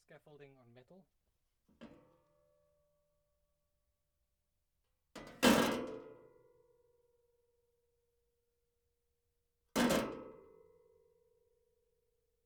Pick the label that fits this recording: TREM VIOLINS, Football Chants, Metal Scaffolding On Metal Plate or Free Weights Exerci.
Metal Scaffolding On Metal Plate